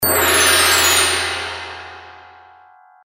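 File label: outro sound effects; Dragon Boat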